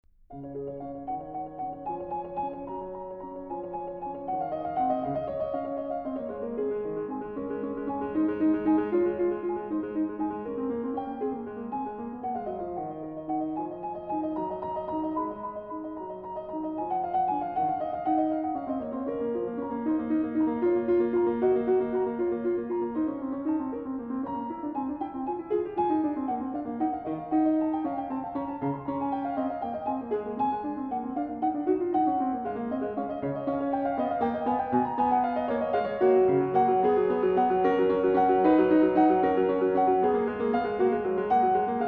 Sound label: Prelude in C-sharp, The Well-Tempered Clavier; BWV 848; The Well-Tempered Clavier, Book 1; Angela Hewitt